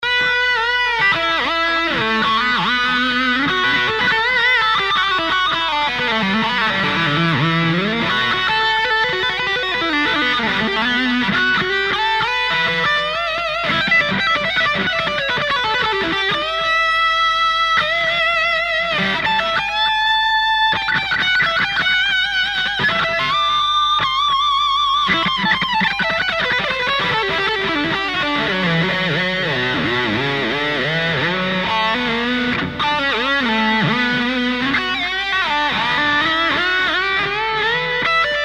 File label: metal echo park vox ac30, distorsion guitare; Ubermetal; lag; line6; tonecore